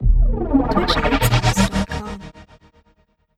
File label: Dub Subscriber